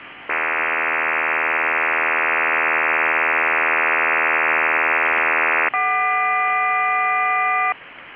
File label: CODAN CHIRP, CODAN 80 Bd, BPSK CHIRP WAVEFORM